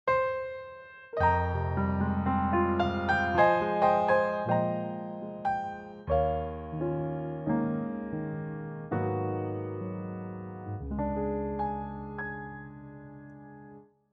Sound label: Gb Trio